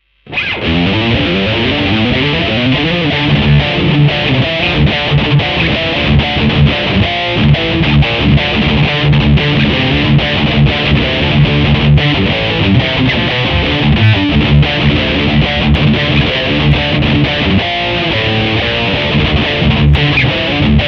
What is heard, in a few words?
metal thing drop d